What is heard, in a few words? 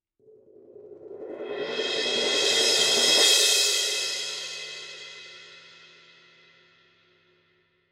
formula 602 classic sounds 19 thin crash roll; paiste